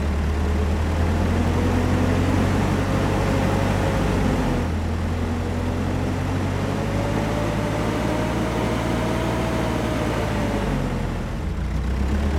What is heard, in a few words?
vehicle forklift drive